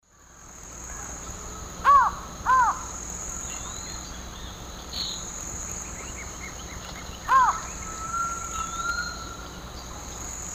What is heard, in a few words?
Thamnophilus cryptoleucus, call; S. America; Eastern Lowlands